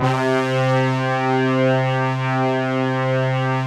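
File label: synth brass c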